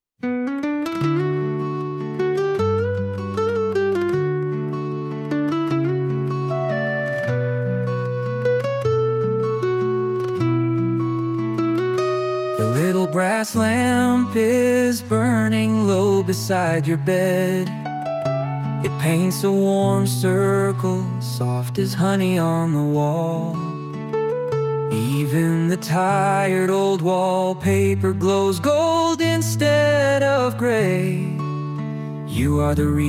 lullaby, name songs; inaya

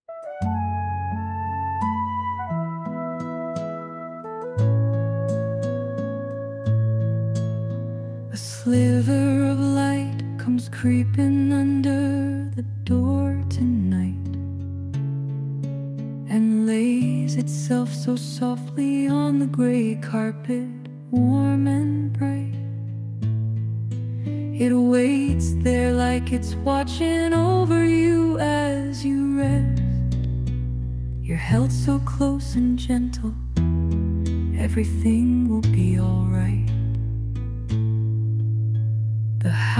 zion lullaby

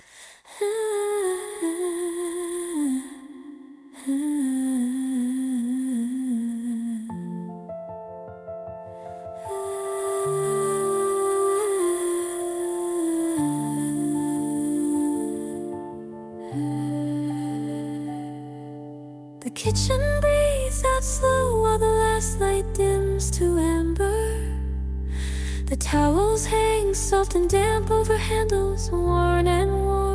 lullaby; wren

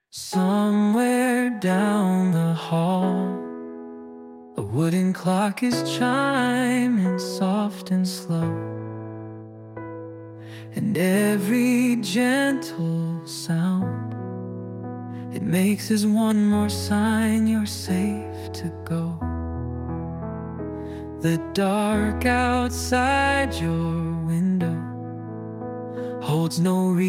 lullaby, name songs; heidi

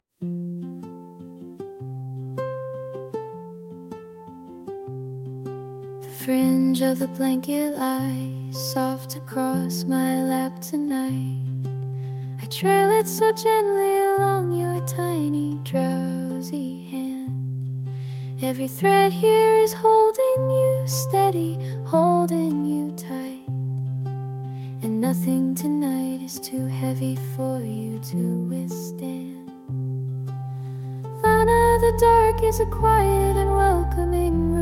lullaby, name songs; lana

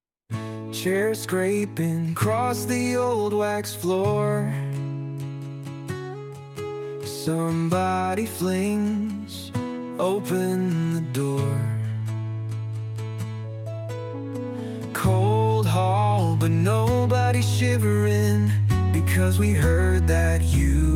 birthday, name songs; clive

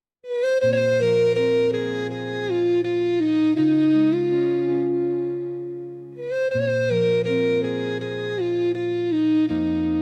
lullaby, name songs

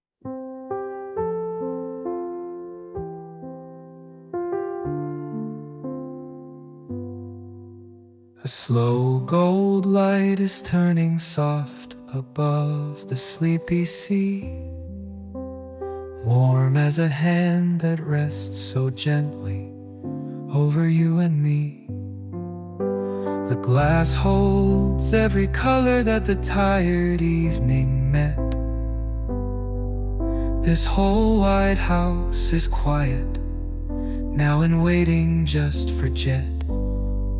lullaby, name songs

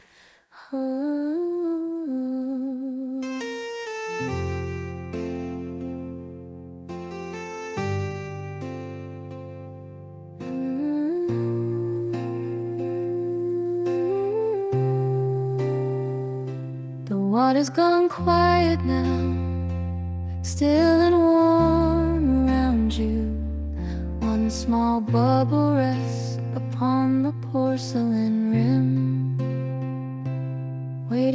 lullaby, name songs; koa

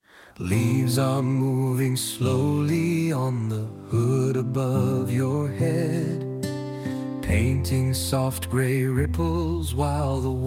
lullaby, name songs; chloe

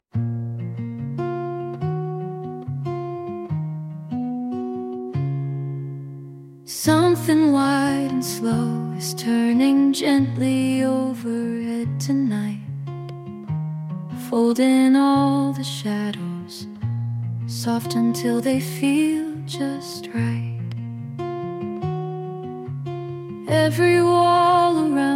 lullaby, name songs; dawn

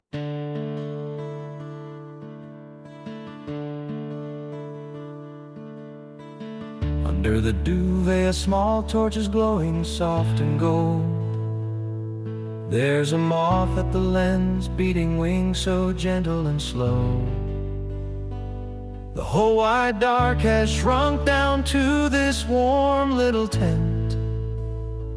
lullaby, name songs; jeff

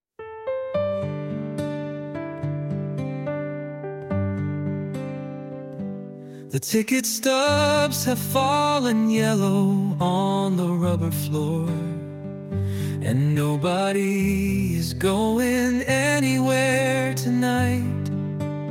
tanya lullaby

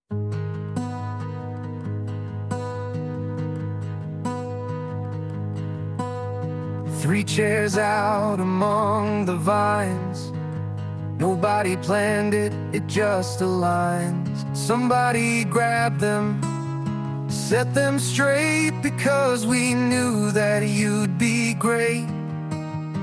birthday, name songs; leslie

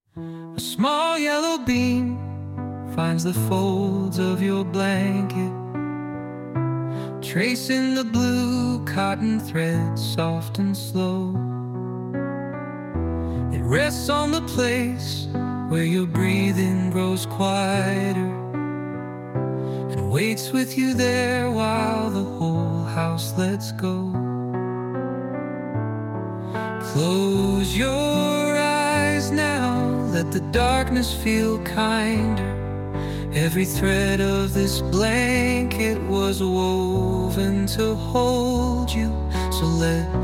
shannon lullaby

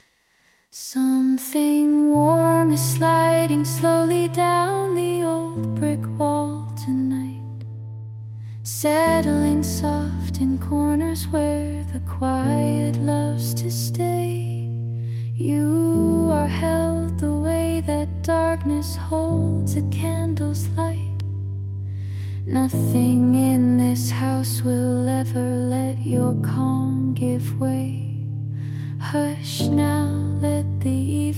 lullaby, name songs; harley

